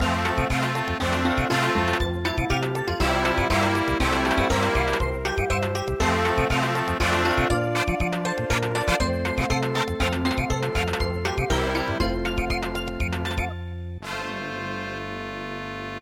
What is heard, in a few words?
stenkarajin samba